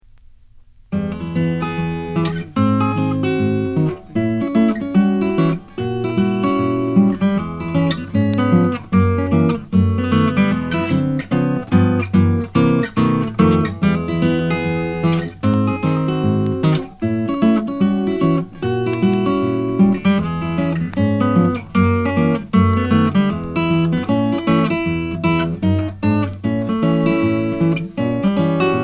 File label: Ragpickin